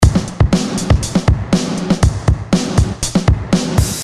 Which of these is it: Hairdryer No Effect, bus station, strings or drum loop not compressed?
drum loop not compressed